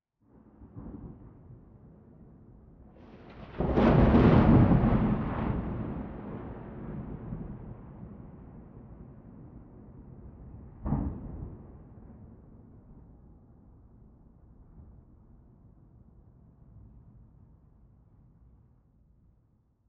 thunder - lightning - without rain wind background noise; General Ambience